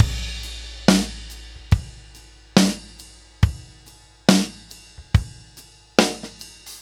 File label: Ballad Loop, Drum, 70s Ballad; Laidback; Ballad Loops; Drum Tools